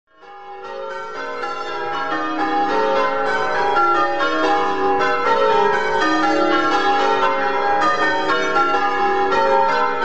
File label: Stedman, tower; St. Columb Minor